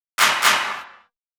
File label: clap